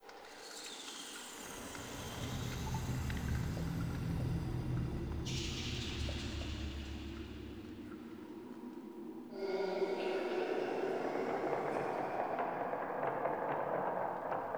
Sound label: Wake Up Poppet Ambience; Bromius; Bromius Quest